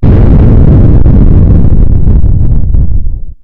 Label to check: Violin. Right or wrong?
Wrong